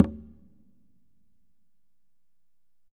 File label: Congas wet, drums percussion